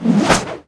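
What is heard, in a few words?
attack one hammer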